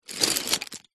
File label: office pencils and pens short movement on surface